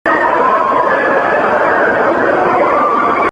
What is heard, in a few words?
hell sounds; siberia